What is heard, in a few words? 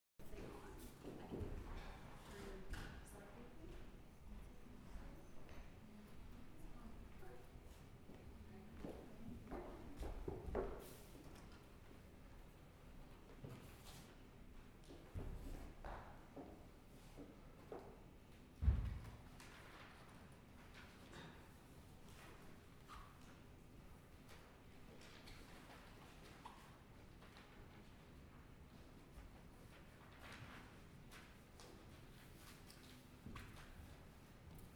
Ambience